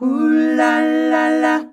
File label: UHLALALA, males; Best Service; Hyper Dance